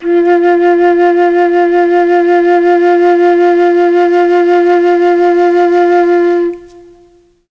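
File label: F4 chamberlin flute, mellotron; CHMBLN FLUTE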